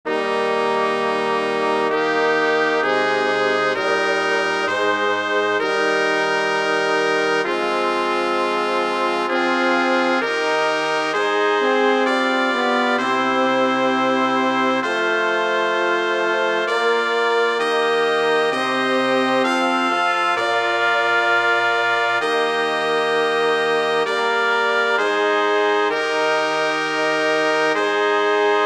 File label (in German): Missa Sanctus ALLE; ohne Dynamik; Kaim